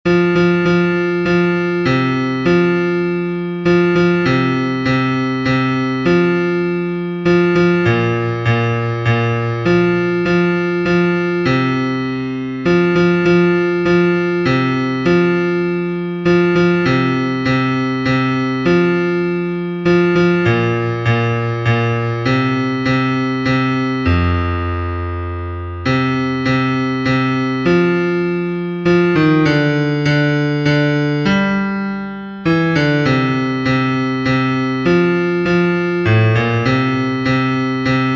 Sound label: Bass